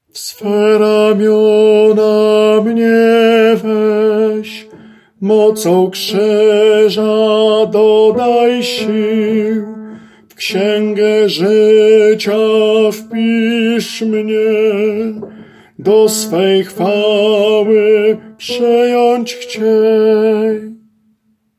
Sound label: TENOR